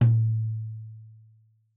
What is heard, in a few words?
Tom2 Stereo, Deeply Sampled Drums